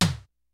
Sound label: kicks snare, kid; Kid Nepro; Elite; KICKS SNARES